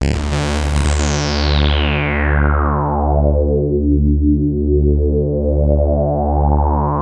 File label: Sawtooth Drone D